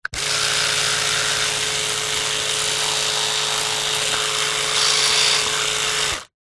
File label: household razor electric male trimmer operate cut shave close up; remington